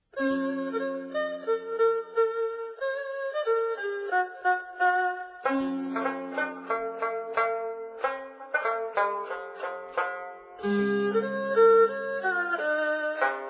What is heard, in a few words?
bgm Music; tomato-scrambled-egg